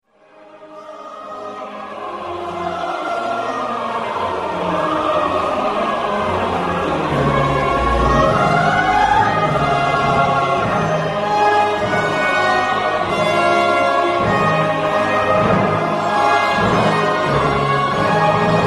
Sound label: Amen Chorus; Choir